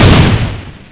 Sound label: cannon shoot; Ferazel's Wand Demo